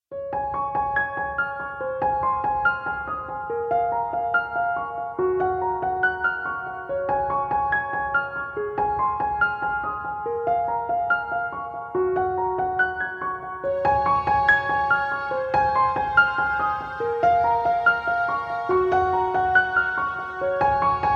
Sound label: Melody